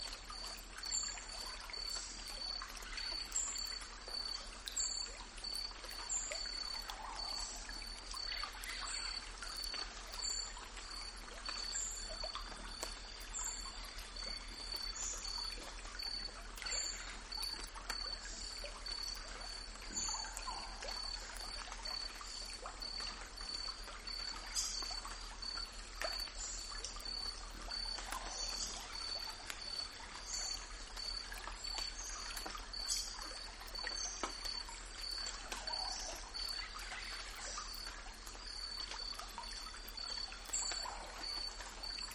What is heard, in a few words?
Insects chirp by the stream